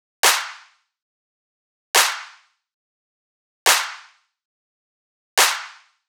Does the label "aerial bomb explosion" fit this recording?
No